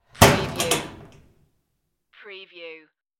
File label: close locker door